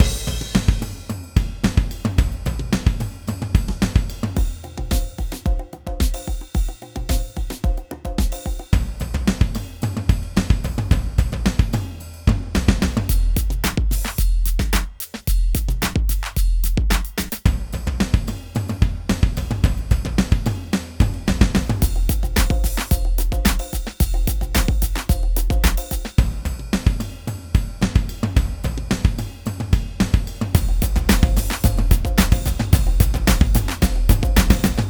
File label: Drum Rondo; iOS GarageBand